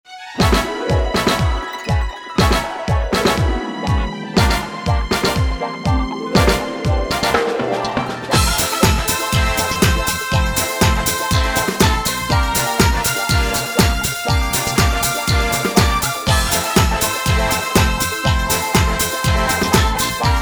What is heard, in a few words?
Retro TV Theme Disco Ball